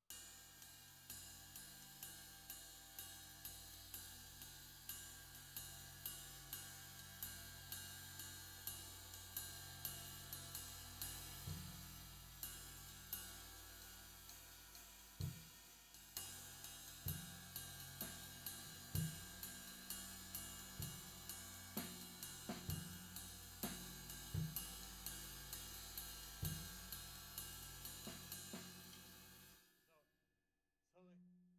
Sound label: Floor